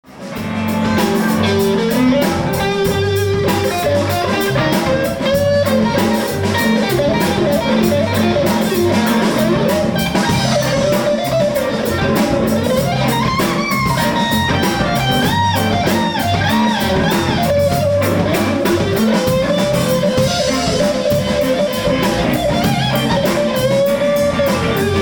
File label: funky jam